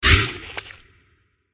scorpion wound

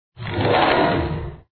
pet armadillo

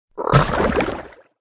habu attack voice bottom

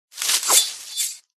forest spirit

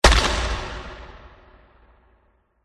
angel attack contact middle

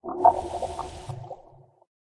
Water Spirit Die

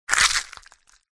crab wound

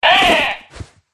raven death

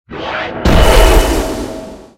Dragon Die

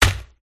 elf attack contact mid

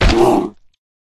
White Bear Wound

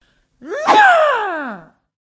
bot human female attack voice up

